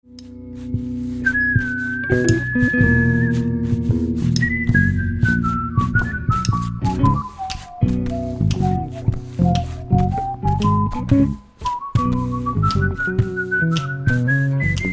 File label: Relaxed Blues, vocals